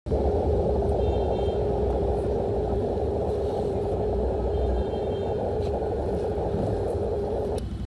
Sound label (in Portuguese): Ruido; sabesp; vazamentos